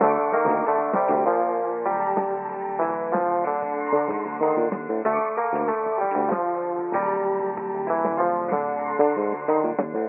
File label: keys